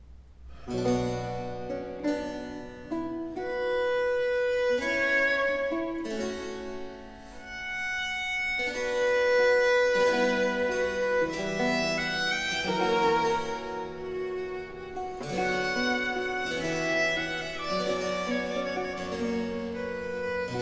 Sonate 11 in E major 3. Adagio; Arcangelo Corelli; Corelli - Complete Works; Klassika

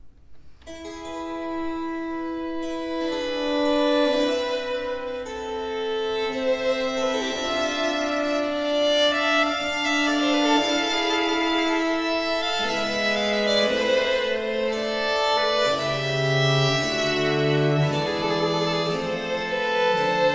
Sonate 6 in E major - 2. Adagio; Arcangelo Corelli; Corelli - Complete Works; Klassika

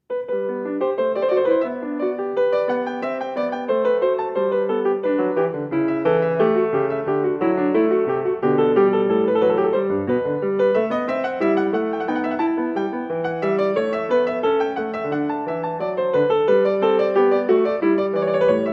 French Suite for keyboard in G major, Allemande; Bach, Johann Sebastian; French Suite for keyboard No. 5 in G major, BWV 816- 1. Allemande

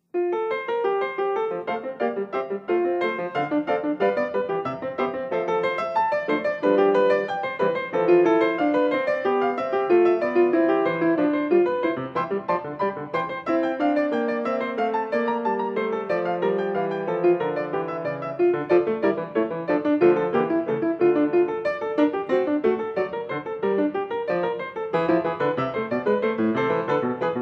Prelude for keyboard in F major; Bach, Johann Sebastian; BWV 928

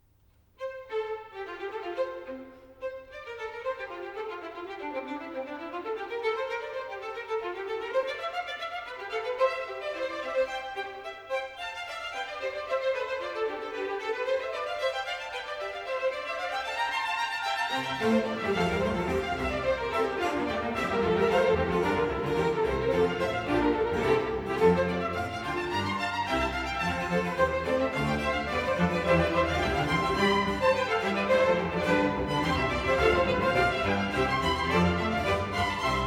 Concerto Grosso in F major, Allegro; Handel Masterworks; Klassika

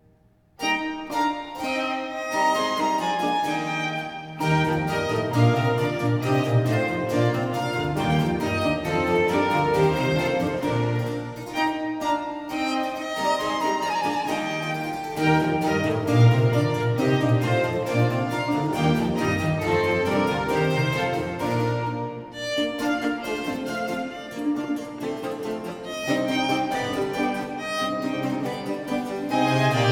Concerto 6 in F major 5. Allegro; Arcangelo Corelli; Corelli - Complete Works; Klassika

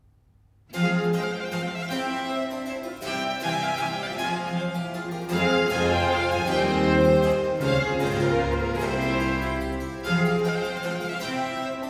Concerto 8 in G minor 1. Vivace; Arcangelo Corelli; Corelli - Complete Works; Klassika

